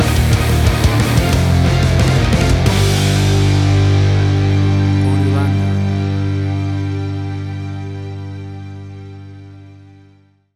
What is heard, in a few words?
Action Punk Heavy Rock Epic Music Stinger